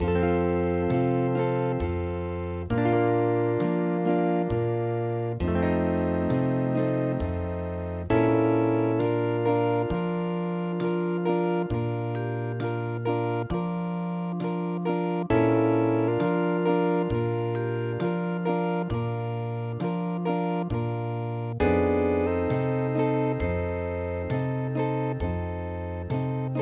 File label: silent night hawaian